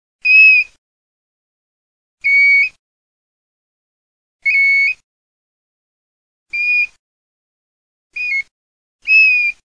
PIVIERE

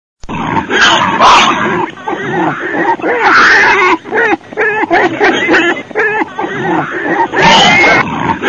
CINGHIALE LOTTA, birdsound

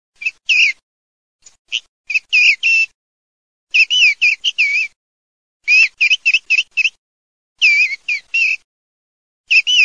TORDELA

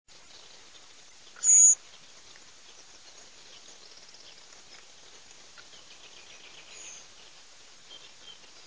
SASSELLO STRISCIO E CHIOCCO

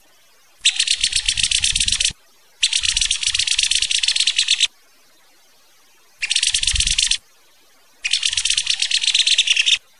schiamazzo

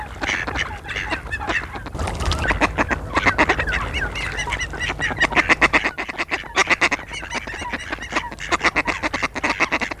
GERMANO

